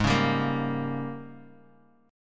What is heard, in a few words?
G sharp Major 7th Suspended 2nd Suspended 4th, Bass Standard; JGuitar